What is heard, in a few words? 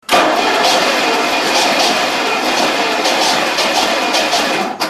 bad ignition amp